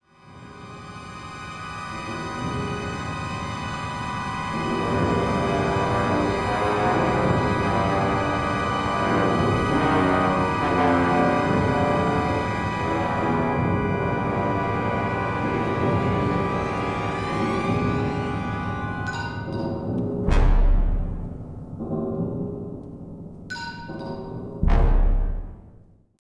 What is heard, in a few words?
tension, music